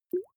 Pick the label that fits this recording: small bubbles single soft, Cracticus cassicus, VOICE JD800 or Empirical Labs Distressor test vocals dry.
small bubbles single soft